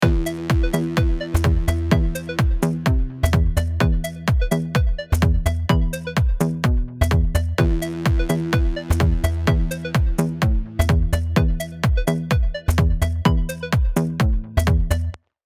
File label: sub, mix